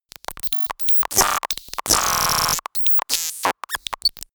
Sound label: glitch communication